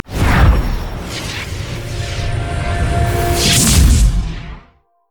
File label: summonerspell base teleport; LoL